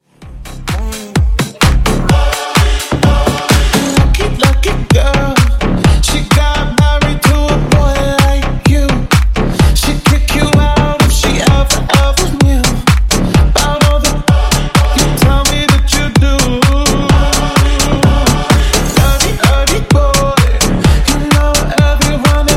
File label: Bassline 128 Bpm